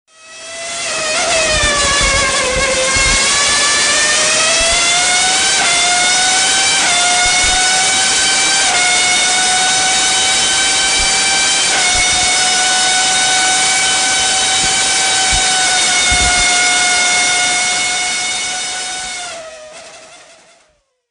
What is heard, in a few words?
Racing SE